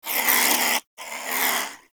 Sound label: Vocal Snore; Characters; Critters; Warframe; Cryptilex; Hunting Critters